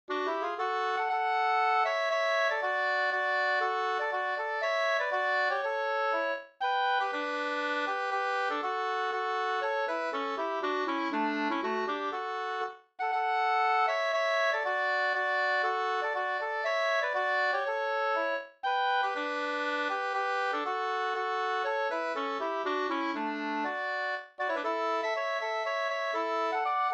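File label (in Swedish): polska, tvåstämmig; Roslagen Up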